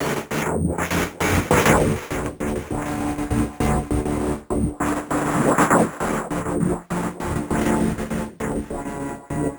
Envelope Beat; Drums & Drones

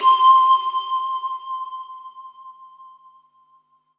SQ1, Synthesizers

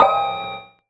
Misc Stab

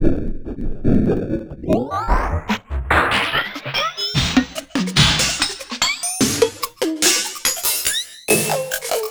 144 ELEC FX 3, Industrial Loops

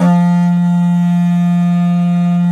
F2 HORN, Didgeridoo; Big Fish Audio; DIDGEHORN1

